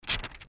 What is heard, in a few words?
paper